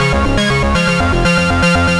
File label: Synth Arpeggio, Synths; Eclectic Electronica